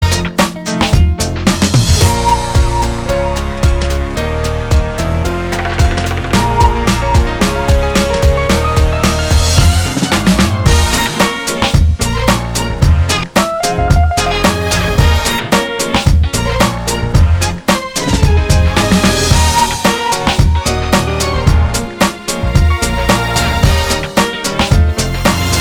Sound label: inst